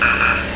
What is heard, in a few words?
buzz